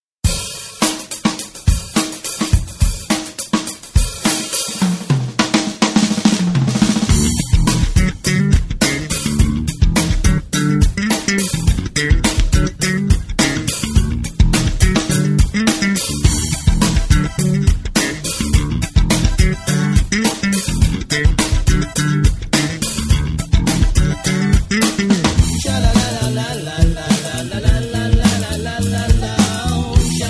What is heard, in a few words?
Sax With Kazoo